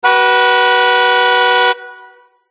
Horn; Life of a Naomi

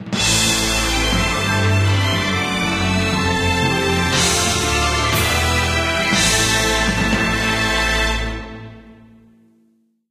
Fanfare; Life of a Naomi

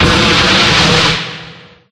Explosion; Life of a Naomi

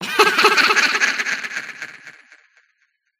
Devil; Life of a Naomi

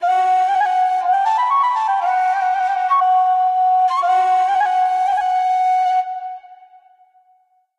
Musical; life of a naomi; Naomi Carrigan

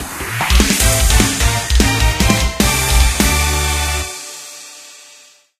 Victory; Life of a Naomi

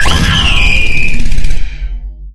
Down; Life of a Naomi

